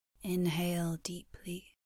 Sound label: inhale